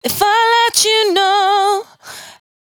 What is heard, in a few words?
92bpm Bb, Velvet Vocal; Zero-G